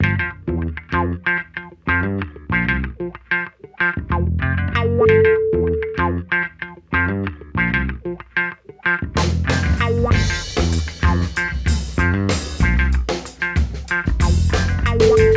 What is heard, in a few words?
funky places